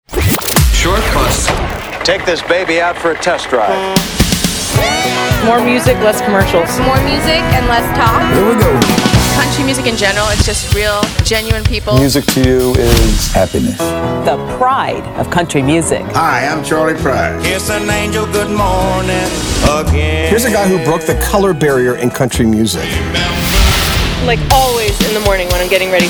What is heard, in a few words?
Traditional Country